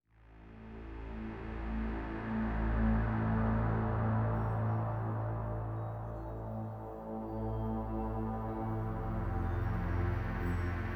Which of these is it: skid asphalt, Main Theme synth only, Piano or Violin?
Main Theme synth only